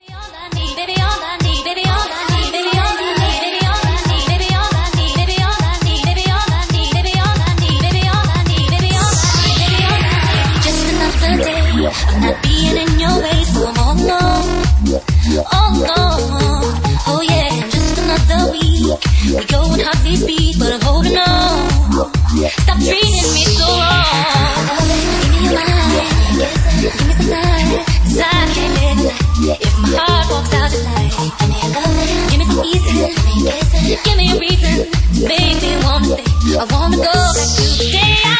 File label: More Bassline Remix